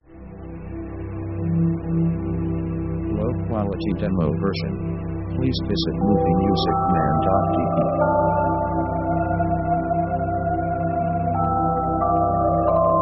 orchestra big picture

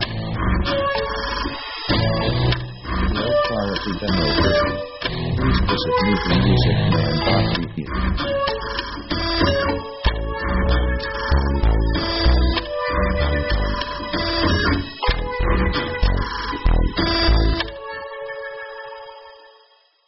typewriter fun; synth themes

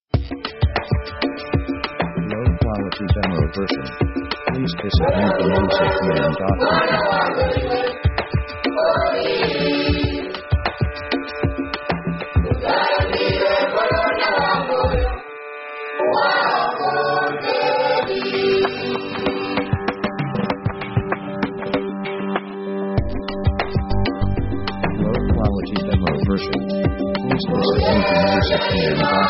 african set; upbeat themes

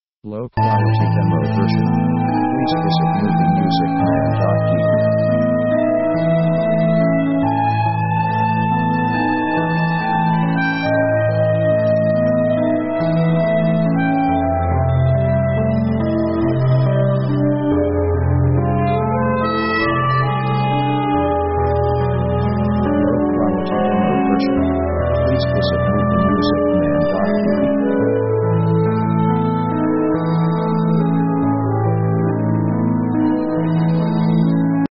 synth orc mystic sketch; synth themes

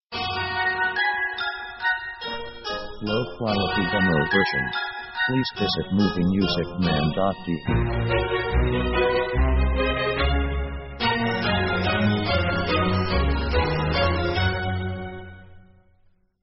classical interlude

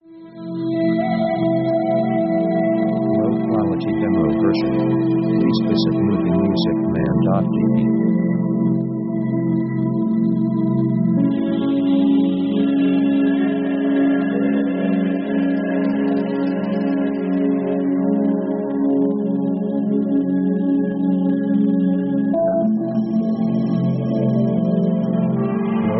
Synth Atmosphere; synth themes

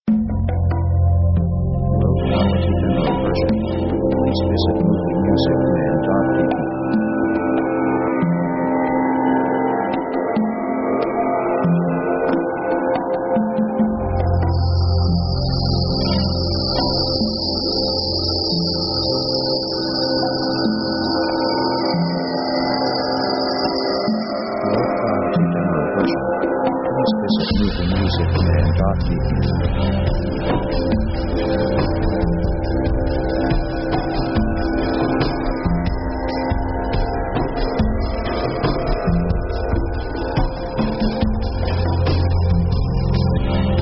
atmo big and wide; Background themes

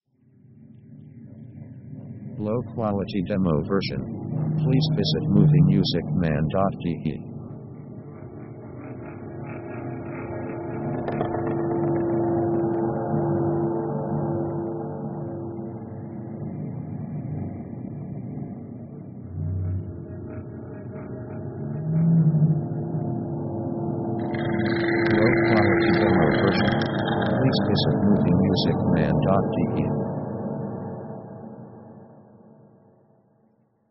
orc mystical

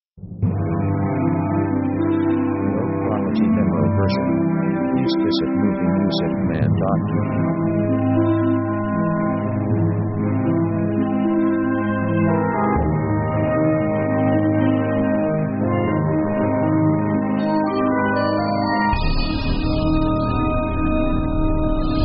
orchestra flowing arpeggio; orchestra themes

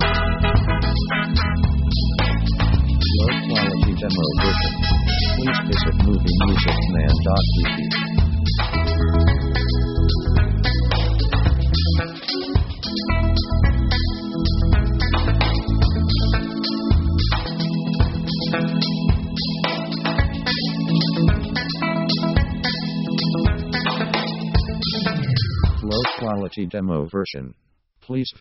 reggae

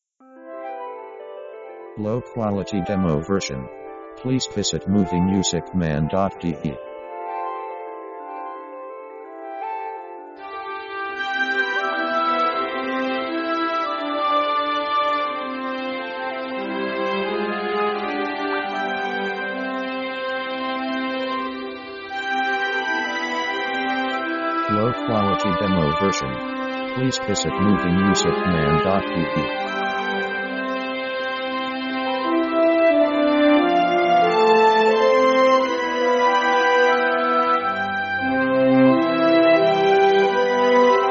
a summer breeze, orchestra; orchestra themes

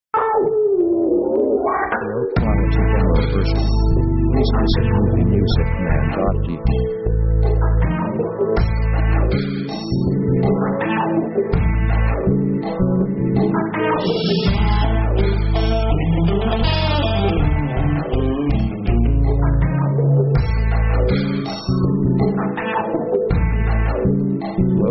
rock 80bpm metal trash; Basically slow

